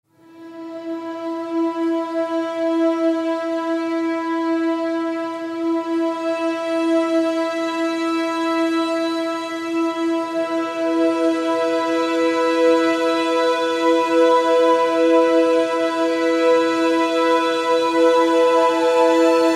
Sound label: tones; Kingdom Of Fantasy; Tones: Strings Tonebuilding In E